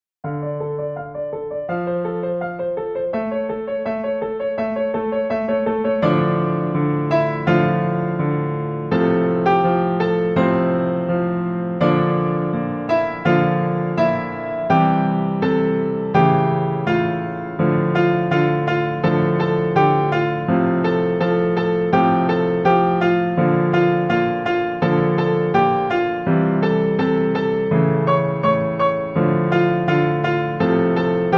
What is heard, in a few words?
Piano; Gravity Falls